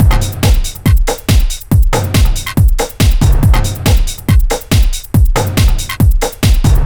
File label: Complete Loop, Dance Beats